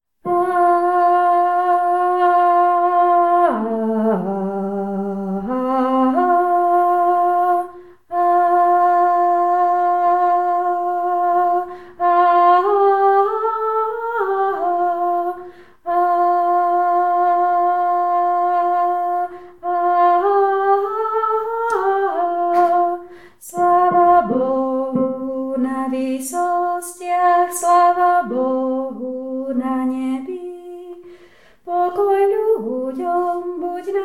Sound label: bass; slavabohu